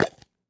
peanut jar lid open

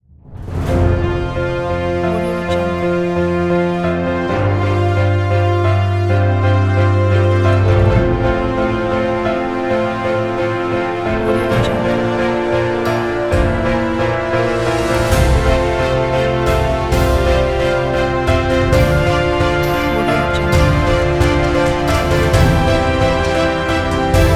award ceremony opening intro

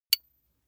object metal small impact medium high damped; smartsoundfx

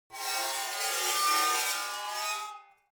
object metal scrape rich slow

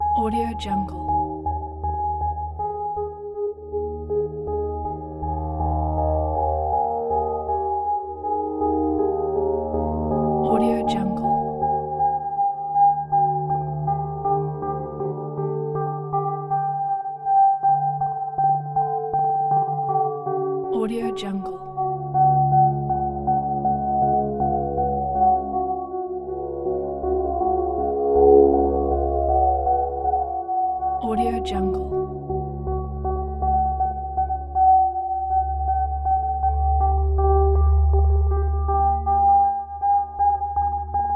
scifi pulsation

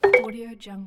match 3 combo fx

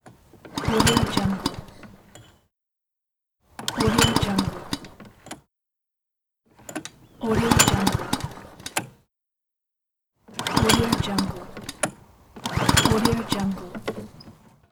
unsuccessful start gasoline lawn mower

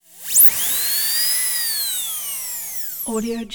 dentists drill; prosoundfx

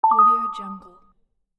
notification

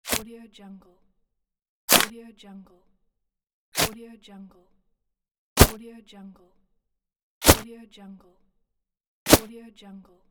foley item grab pickup pack

